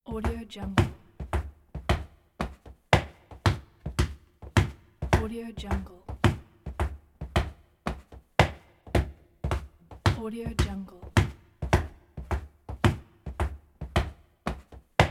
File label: man walking